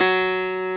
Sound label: Piano A0